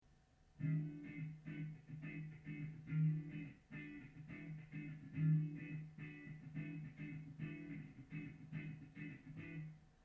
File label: clean